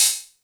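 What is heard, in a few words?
Hihat Closed FX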